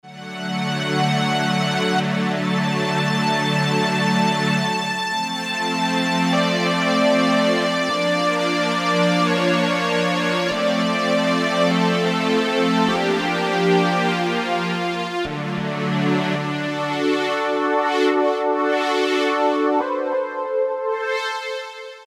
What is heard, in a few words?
Ana Strings, Korg MS2000; Factory Patches